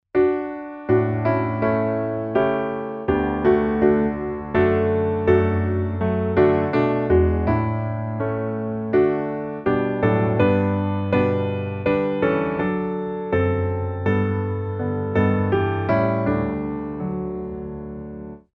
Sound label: piano